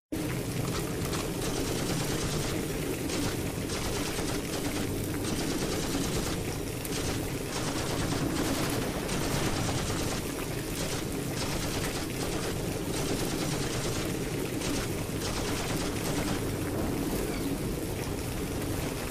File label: off vocal